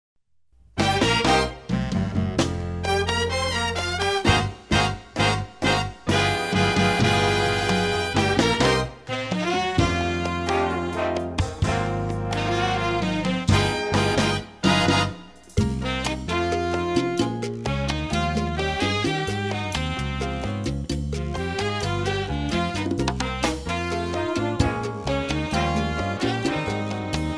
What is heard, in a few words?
Jazz Orchestra